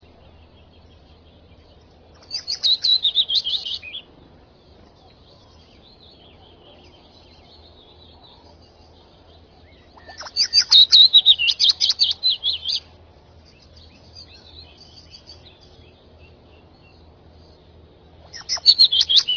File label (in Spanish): mosquitero